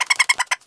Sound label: Hit Squirrel